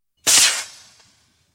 Lifeboat, single maroon fired; 48ft 6in Oakley class self-righting boat, RNLB 'Charles Henry'; BBC

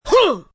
Grunt Pushing, Grunt, groan, impact, male; Groaning Sound Effects

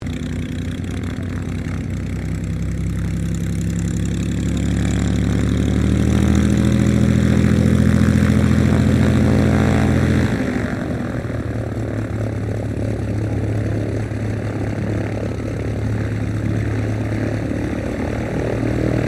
AIRCRAFT PL-12 AIRTRUCK IDLE SHUTDOWN; 1965; Airplanes

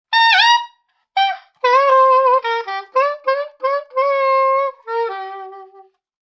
Cool Sax Riff, A cool saxophone riff; Horns; Musical

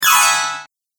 Casting Spell, Magic Sound Effects

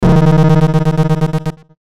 Electrical Hit Stutter Buzz; Blastwave

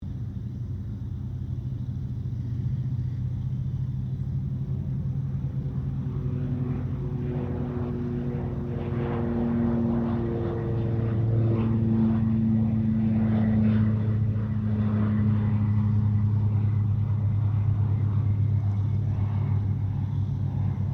2007 KING AIR 350 TAKEOFF DISTANT; Airplanes; Vehicles

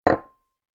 Kitchen Heavy Glass On Wooden Table; Kitchen Sound Effects

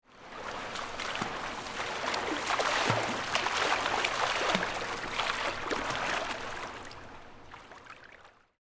Pool Indoor Wade, Water, Splash; Pools; BlastWave

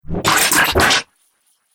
Science Fiction Laser Breaking Up

